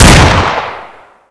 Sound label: fire alt; HL2-Nerv; melts